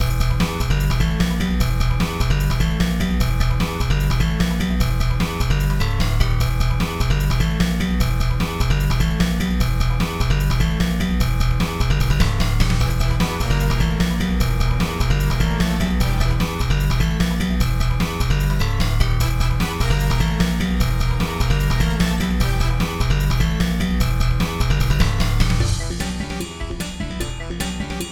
Boss Battle; mafia wars 3 yakuza

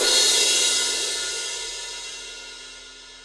Crash; torchlight iii; ps4 switch windows xbox one gamerip 2020

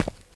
Ground Tap; blastmorph pinball; xbox series xs

